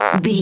fart squirt

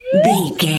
whistle slide up

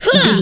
voice female attack

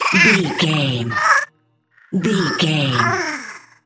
goblin fairy death pain

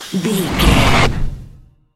fear confuse horror spell

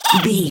goblin fairy attack

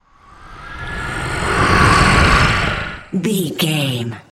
dark wind growls

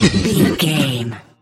troll monster cough

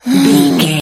voice female effort tired

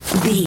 footstep snow walk

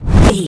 casting charge whoosh buildup

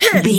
voice female attack

